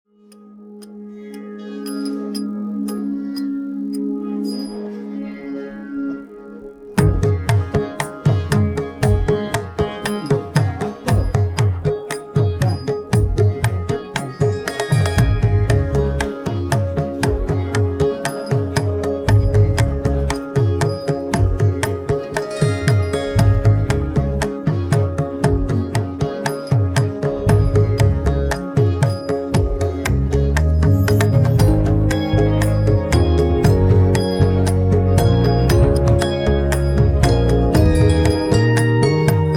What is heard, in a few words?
wedding music